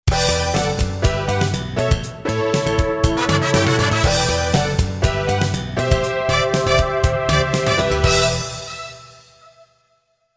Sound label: jingle